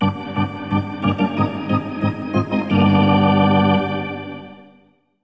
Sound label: Hockey Organ